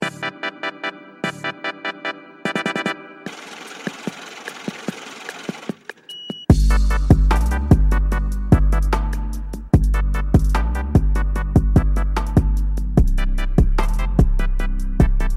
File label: trap remix; wii shop channel